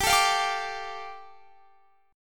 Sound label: G 7th Suspended 2nd, Guitar Standard; strummed; JGuitar